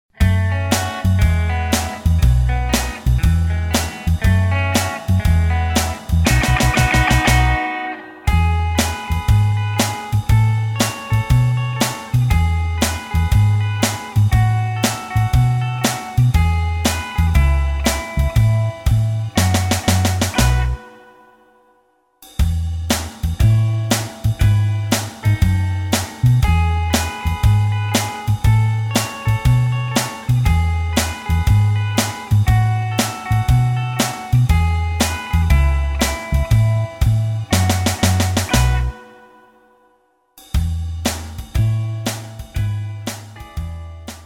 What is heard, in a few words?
(E) No Backing Vocals